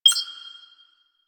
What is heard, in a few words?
UI Select; Warframe; Quests; The New War; Zariman Class Quiz